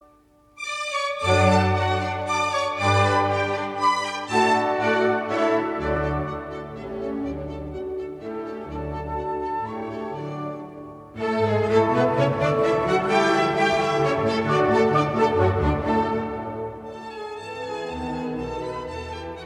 Serenade No. 7 in D Major, K. 250 VII. Menuetto; Haffner; Mozart, Wolfgang Amadeus

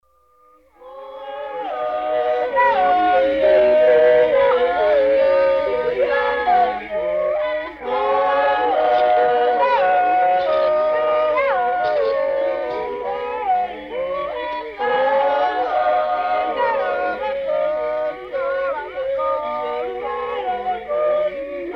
Pygmy Girls' Initiation Song; Voyager - Murmurs Of Earth - Voyager Golden Record; Colin TurnBull; Zaire

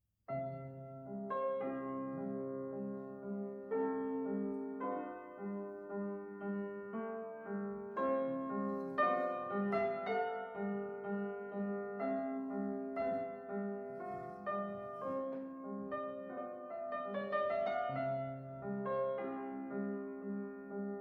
Prelude #15 In D Flat, Op. 28_15; Chopin; Raindrop

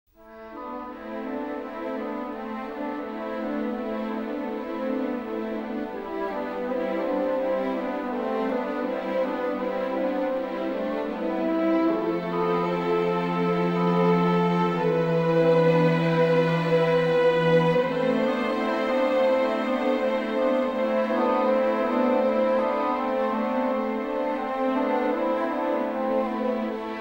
Instrumental

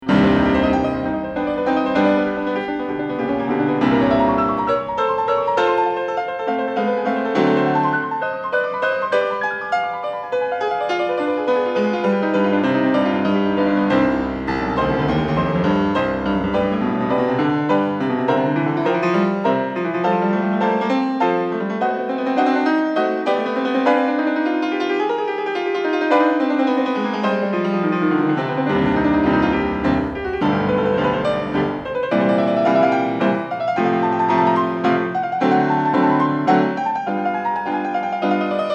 Variations For Piano

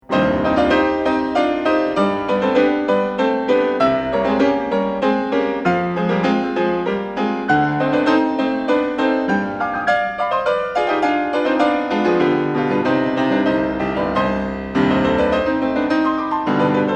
Variations For Piano

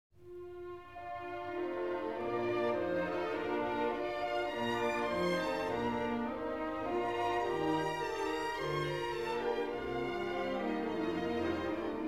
Requiem in D Minor, K 626 XII. Benedictus; Mozart, Wolfgang Amadeus

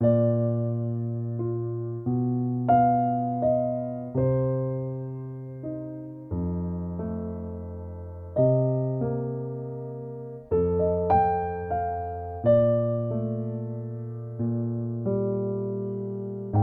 Piano

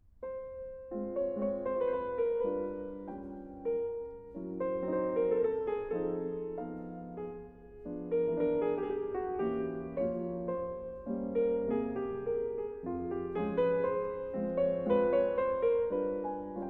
Mazurka In F Minor; Chopin; Op. 68 4, CT 99

